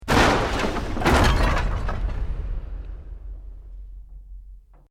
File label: EXPLOSION MEDIUM WOOD